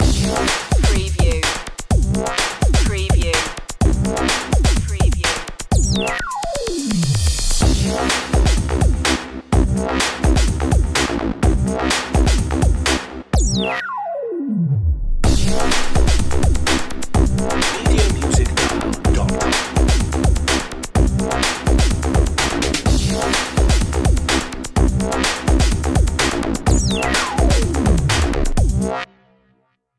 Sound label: acid drop